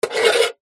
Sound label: office pen biro draw line on metal box